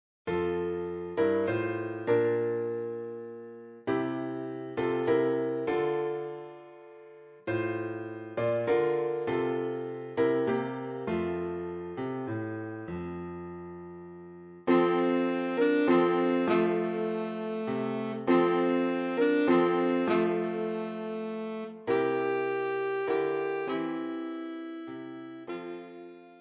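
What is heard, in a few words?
Alto Saxophone; Silent Night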